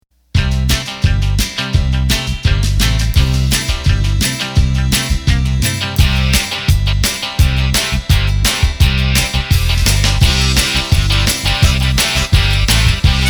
Rock; roland bk7m

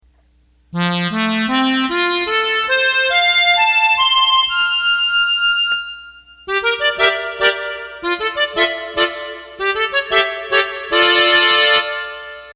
Accordion

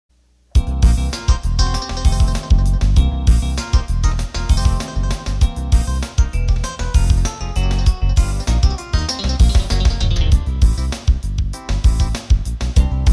Acid Jazz

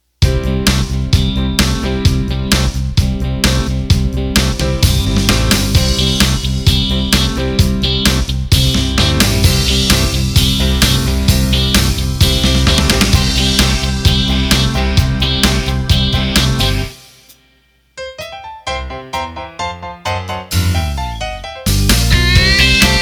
Rock N Beat; roland bk7m

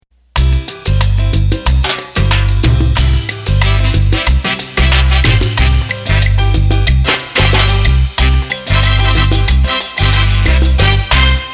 Mamba, accordion; roland bk7m

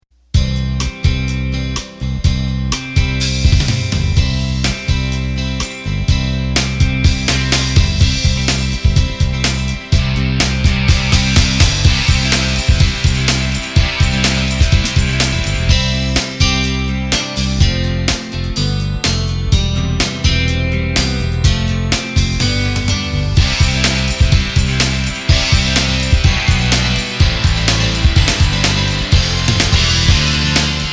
Disco; roland bk7m

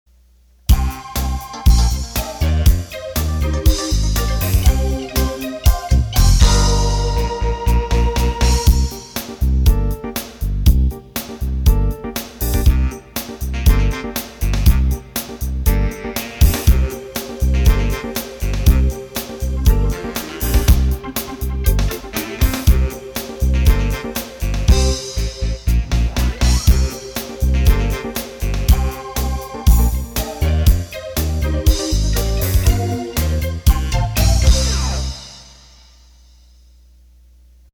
Rhythm Blues

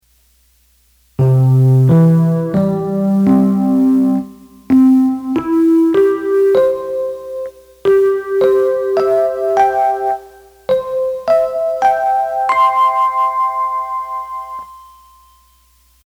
ketron Rhodes Piano

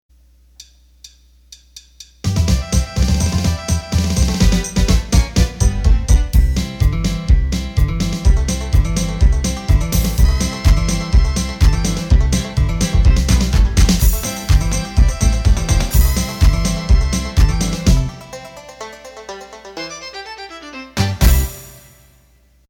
Blue Grass

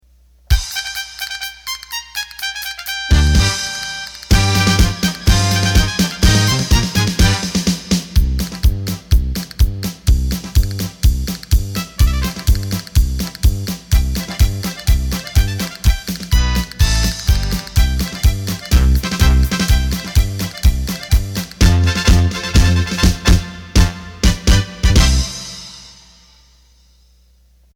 Pasodoble, accordion

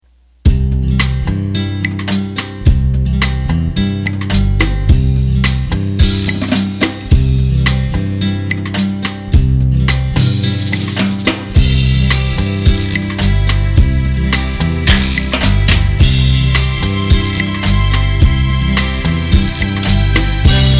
Rumba, Roland accordion; roland bk7m

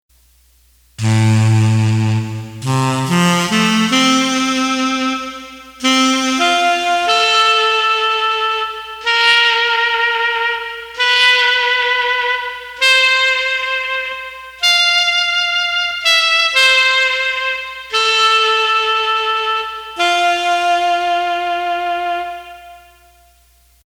ketron Smokey Sax

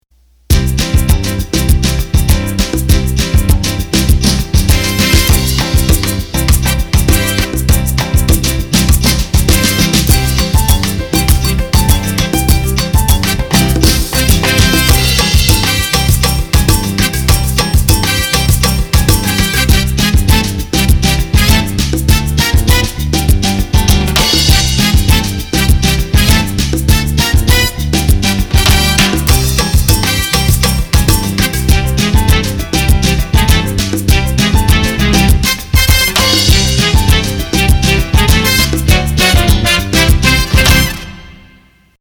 Samba; roland bk7m

